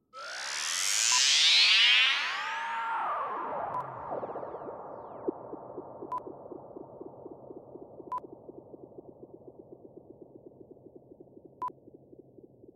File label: Zapper Whoosh